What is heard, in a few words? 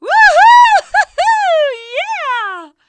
WOOHOO